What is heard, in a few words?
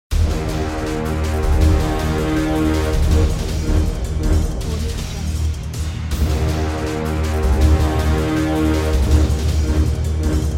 intense intro music